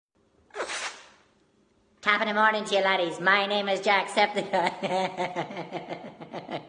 new year fun; jse

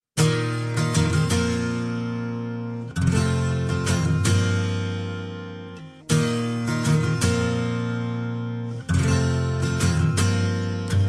guitar; inuyasha

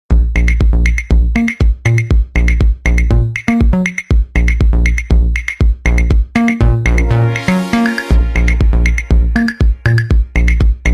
android platform

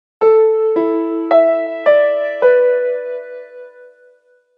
nokia piano

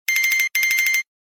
nokia cubicle

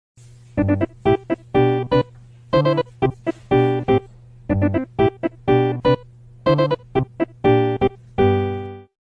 tik tok synth

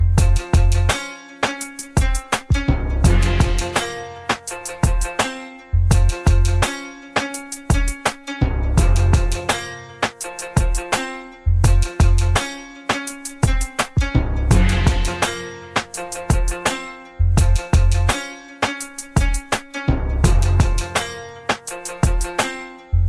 holiday beat

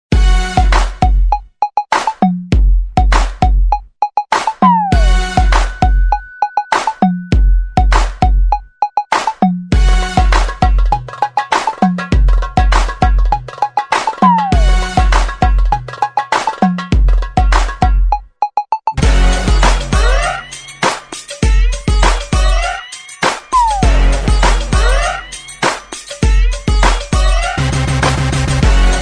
simple ringtone

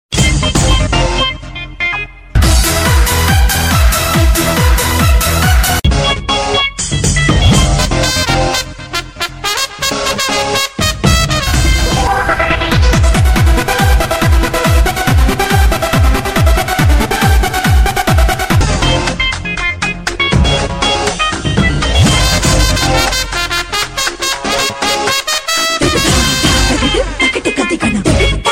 dance world dance

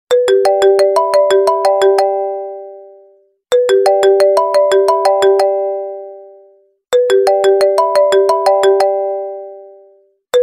apple iphone

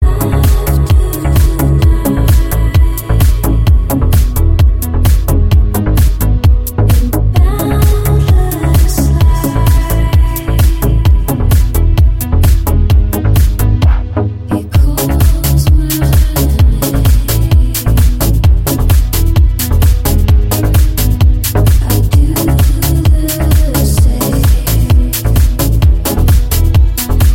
dance music

